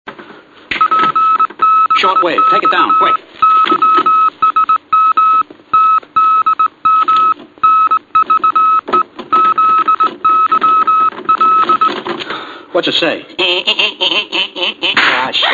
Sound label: telegraph; (Curly And Larry Tell Moe What The Telegraph Said) Moe, Larry, And Curly